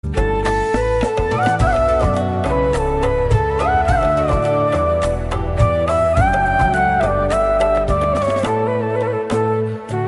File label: soothing sereneness flute cover